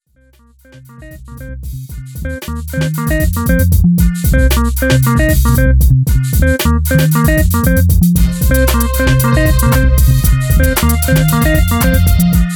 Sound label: Jazzy Funk Remix